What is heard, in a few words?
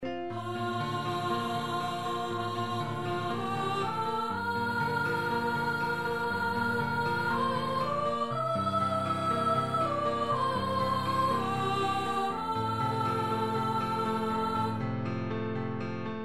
ave maria tenor; gounod